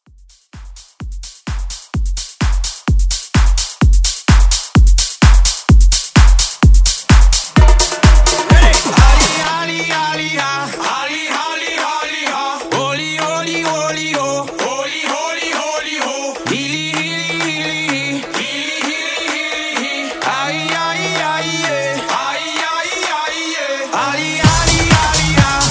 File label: Drum Machine